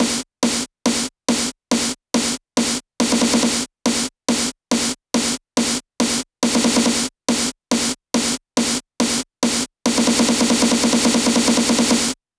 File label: 140BPM SNARE 8 Bars; Mayhem; Vengeance Samples; Snares&FX Pairs